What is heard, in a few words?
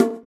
Conga; Equipped Music; Loungin House